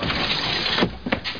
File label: SLIP